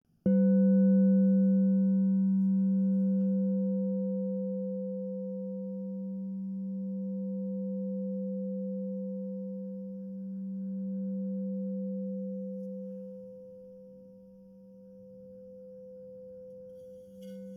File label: Crystal Tones Singing Bowls